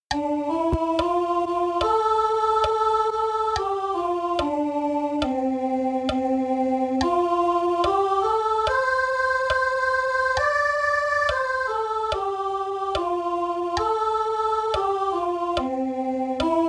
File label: Come il cervo As deer SOP